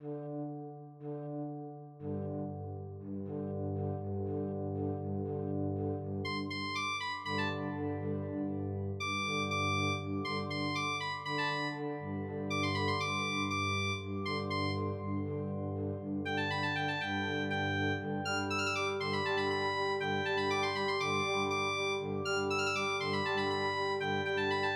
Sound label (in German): Tanz Flauto a Cuspide